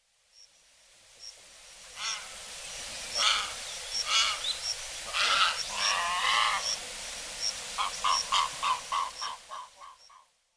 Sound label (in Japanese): murasakisagi, miyako